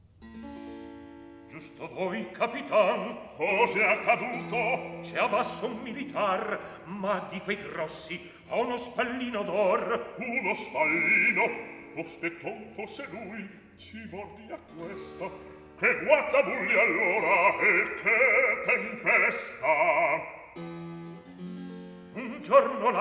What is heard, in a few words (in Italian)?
Recitativo; Giusto voi capitan